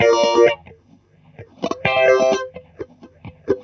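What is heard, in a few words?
GTR, guitar; USB Soundscan vol.32; Roots Disco; AKAI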